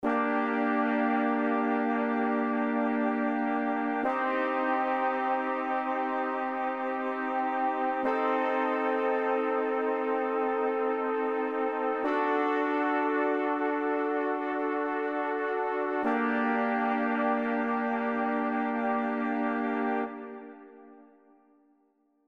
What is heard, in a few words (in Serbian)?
akordi limeni duvaci mol